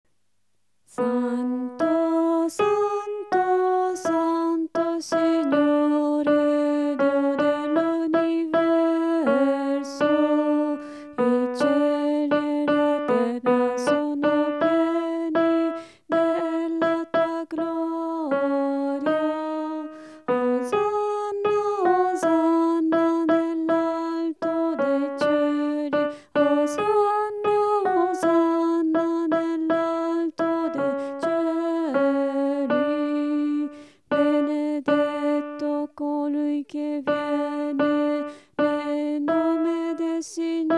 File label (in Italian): Santo il Signore Contralti e Uomini